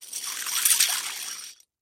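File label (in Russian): zvuki tsepei, chain sounds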